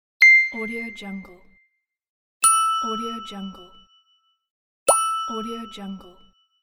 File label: ding